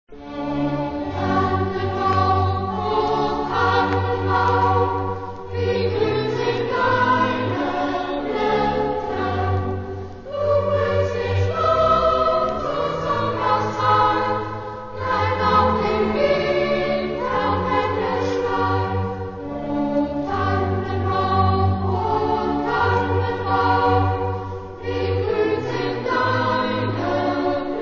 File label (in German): o tannenbaum